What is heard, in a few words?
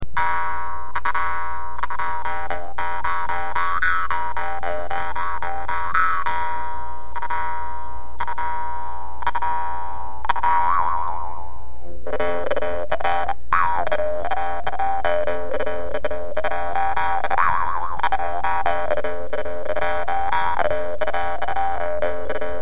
Jew's Harp sample; Gioachino Rossini